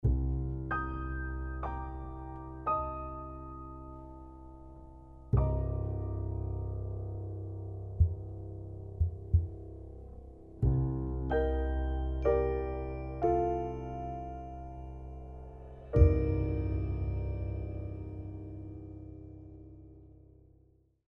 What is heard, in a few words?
Music; Age of Empires III; cinematics